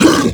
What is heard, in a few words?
Flying Mouth Pain; Enemies; Warframe; ManInTheWall